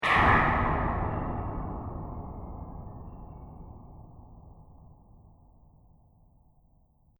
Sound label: Distant Impact; Distant Ko Impact; Miscellaneous Impact Sound Effects